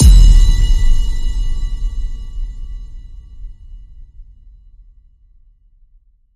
Boxing Bell Boom

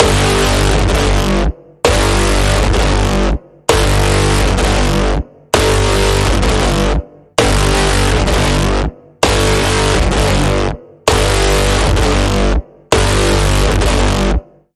Pulsing Code Generator G

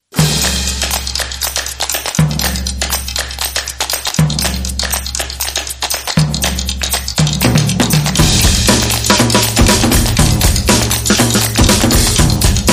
Percussion Party

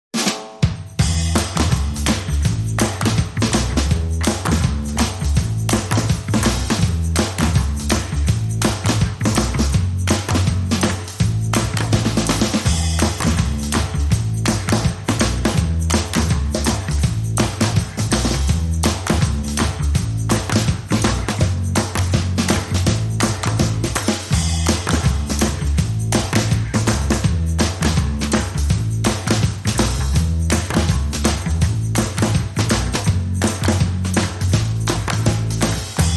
Drums and Bass

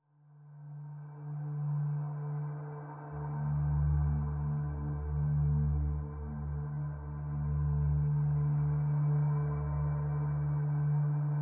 Pads Only

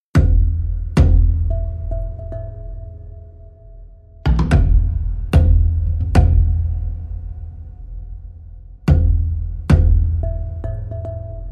Main Motif and Low Percussion Only

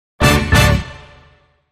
Hit

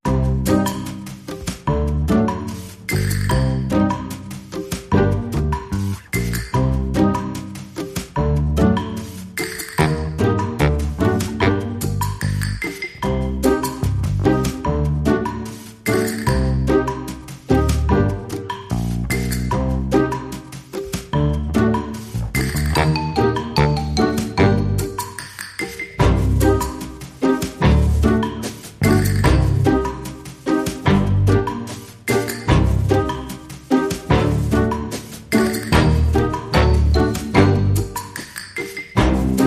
No Melody

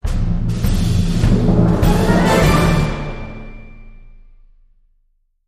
End Sting

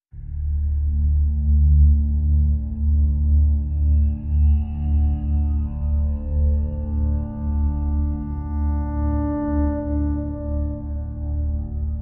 Pads Only

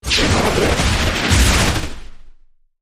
Jumble Flurry, Taylor Grand

